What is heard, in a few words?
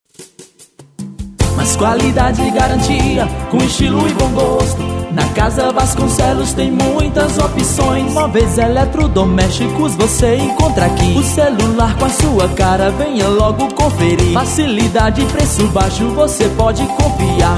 JINGLE; CASA VASCONCELOS